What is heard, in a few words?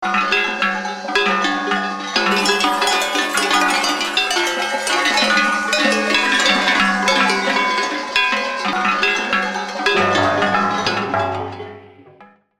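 COWBELLS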